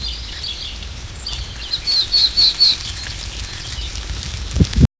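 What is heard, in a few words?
birds chirping leaves rustling; animal sounds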